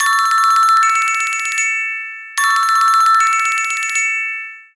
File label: Xylophone; LG